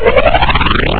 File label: beam In; Pararena 1.3